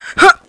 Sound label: jump; quake2; austavin